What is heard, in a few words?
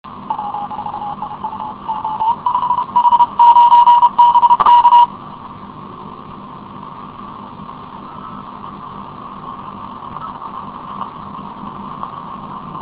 cw